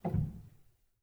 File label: rel, Grand Piano; bitKlavier Grand; Piano Bar